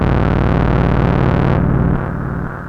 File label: monodelay Fm 95, synth; synth loops